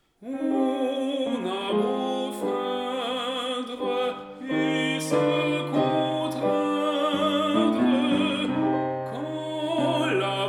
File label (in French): Soprane; Lambert; On a beau feindre